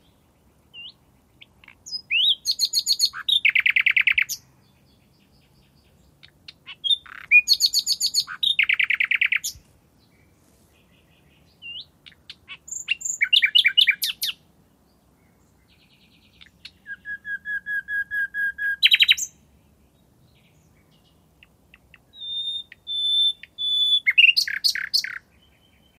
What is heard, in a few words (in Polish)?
słowik rdzawy - koncert; luscinia; wroblowe; turdidae